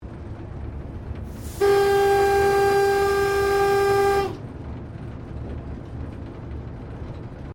Train Diesel TGR X Class 1951 Tasmanian Operating Cabin Whistle; Bellarine Tourist railway vehicles